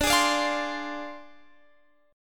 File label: D Minor 7th Flat 5th Guitar Drop D